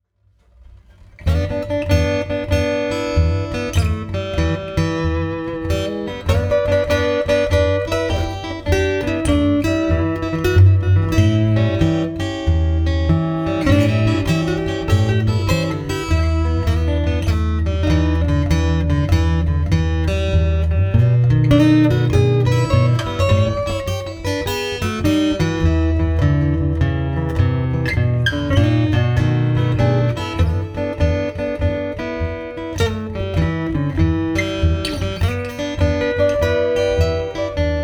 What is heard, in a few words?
blues